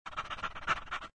dog pant; Sharing

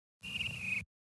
BIRD; Dizzy Bee

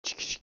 paper bag; English Prep Package; Rex Does Tricks

